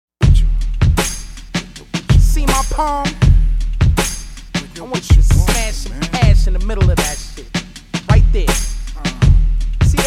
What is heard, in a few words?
polyrhythm break glass